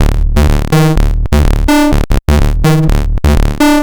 Jackin Bass; Sonic Foundry (Sony Creative Software); Underground UK House & Electro

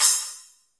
HIGH CYM, hi-tech kits; Crypton - DrumBase5000

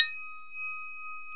BEL, Orchestral Perc; Roland LCDP03

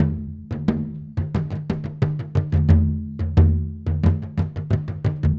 Bodhran, Celtic; Sonic Foundry (Sony Creative Software); Continental Drift Aftershocks

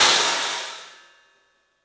CYFX, EFFECT CYMB; 3D Sound; 3D Drums

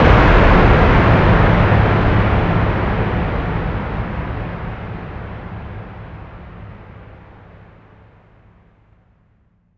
FX Kicks

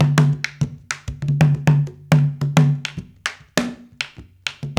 Haitian Congo, World Dance

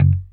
6STR BASS, F#; Sampleheads; Whole Lotta Country 1 & 2

